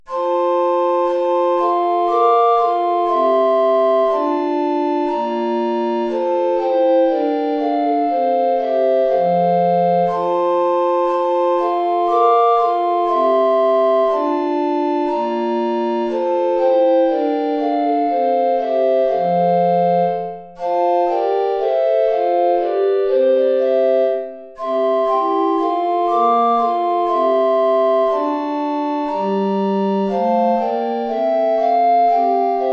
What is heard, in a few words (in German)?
4 Blockflöten (SATB); Es ist ein Ros' entsprungen; tiefe Notierung